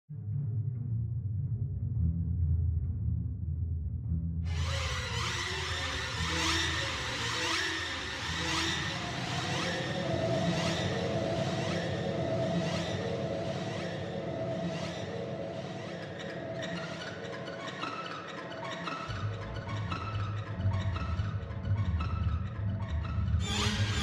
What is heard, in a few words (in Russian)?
strashnaya muzyka dlya fona v video